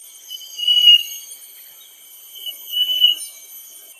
Rufous winged Philentoma male calls, Ulu Kinta Forest Reserve Ipoh Perak Malaysia